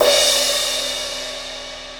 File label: 18 inch crash crash, cymbal; divine; Supernatural